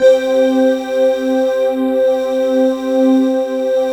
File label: SYN, Wavestation; Sound & Vision - Gigapack I CD 2